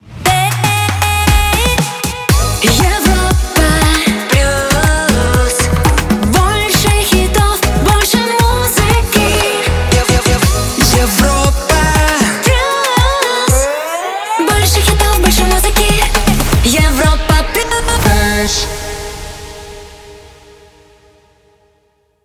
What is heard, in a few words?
Pop Rhythmic 118bpm g; Europa Plus 2025